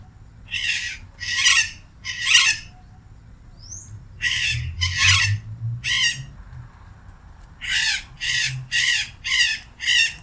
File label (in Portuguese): Maracana